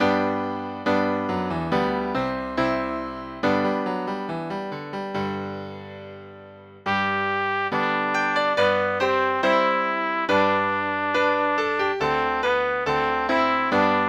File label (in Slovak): pre trúbku a klavír; kto za pravdu horí